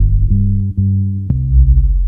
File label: bass evil, Loops